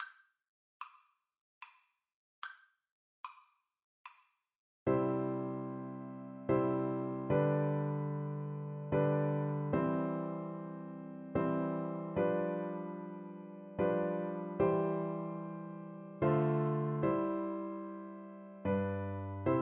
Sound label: drink to me, clarinet